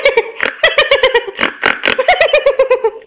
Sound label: funny, ringtone